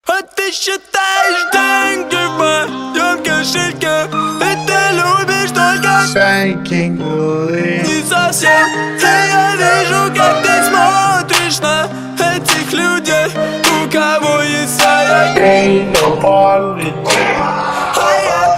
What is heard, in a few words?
Gachi